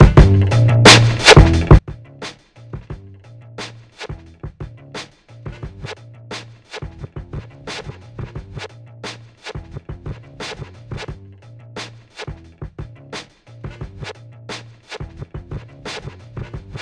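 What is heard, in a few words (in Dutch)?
bass hop varia scratch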